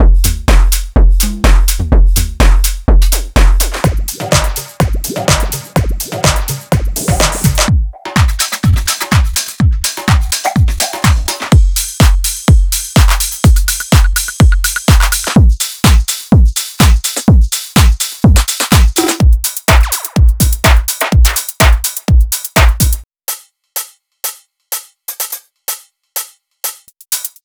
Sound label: groove jackin house